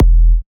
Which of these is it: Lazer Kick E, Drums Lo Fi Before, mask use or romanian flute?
Lazer Kick E